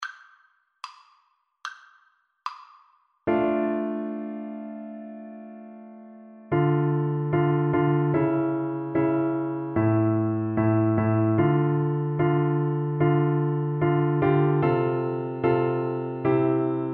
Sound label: beethoven 7 2nd, French Horn